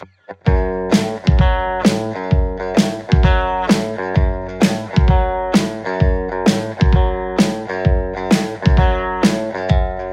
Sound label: tele th3; overloud